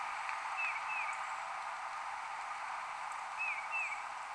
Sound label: TUTI singing